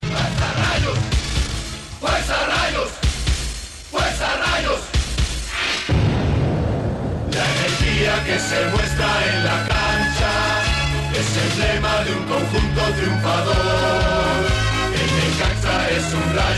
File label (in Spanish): himno necaxista